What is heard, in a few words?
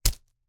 slingshot, dry firing, slap, rubber, rustle, shot